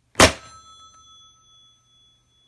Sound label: Phone Put Down